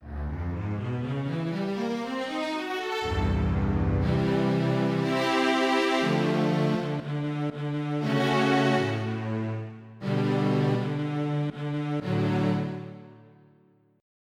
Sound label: Slow Strings; EverQuest